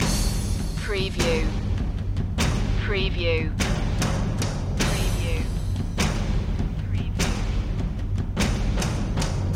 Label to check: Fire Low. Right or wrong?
Wrong